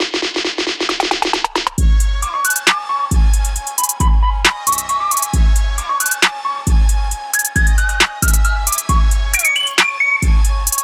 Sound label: neko trap